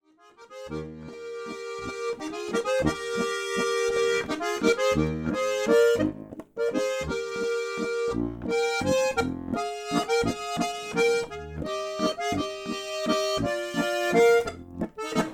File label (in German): Brautwalzer